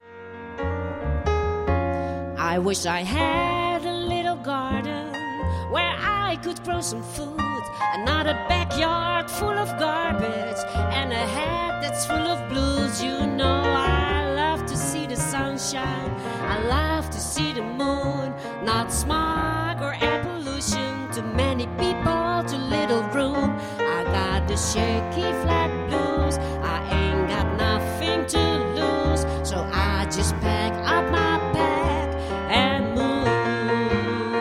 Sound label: Shaky flat blues fragment